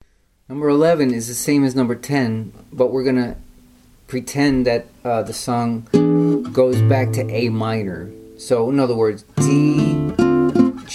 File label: jazz licks